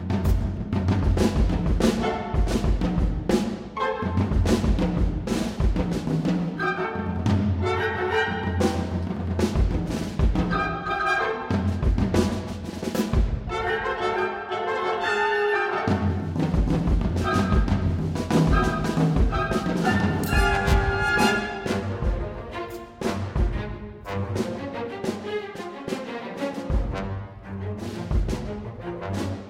kick and ride

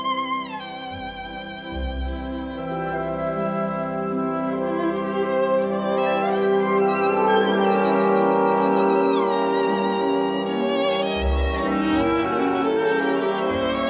violin concerto

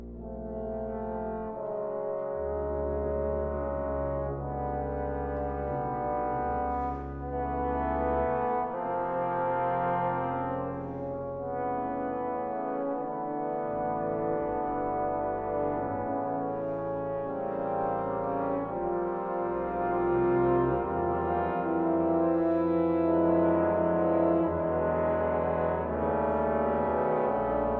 concerto for orchestra, adagio allegro moderato

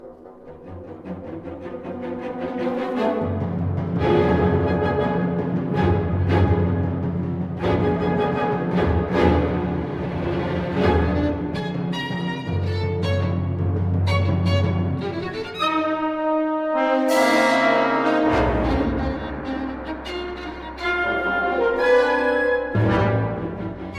concerto for violin and orchestra

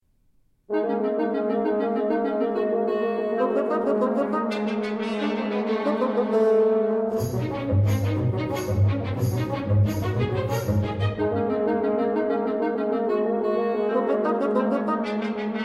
concerto for bassoon and orchestra

